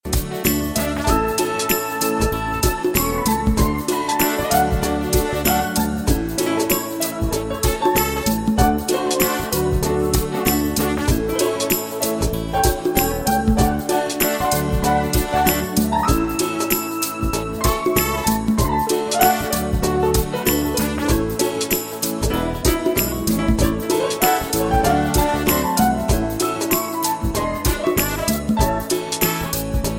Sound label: Swing